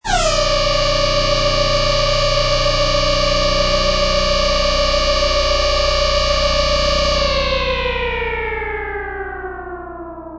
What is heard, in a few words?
Protons Machine Long Decay Long, Strange scifi machine starting operation; with a long time of rotation inertia; Lynne Music